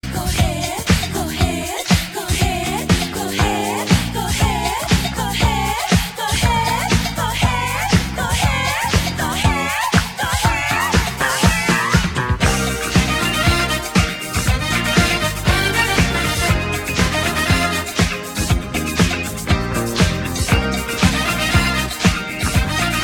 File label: jump beat